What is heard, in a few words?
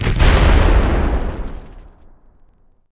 Bomb Two Explosion; Civ4 warlords